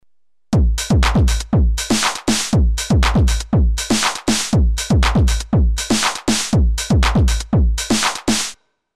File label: Drums Messing Medium